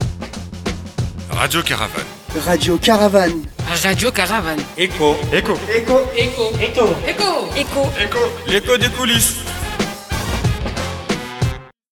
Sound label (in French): Jingle Radio; Caravane 2021; echos des coulisses